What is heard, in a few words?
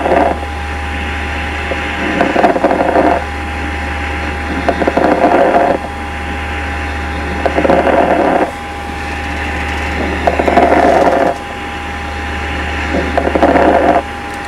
FINE RALES, airway; emt